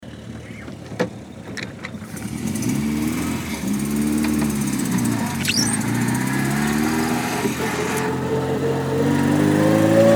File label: tunebug noises